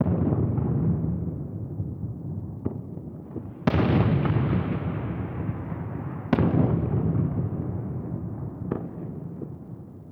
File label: Ambient Distant Explosions; Nineteen Ninety Nine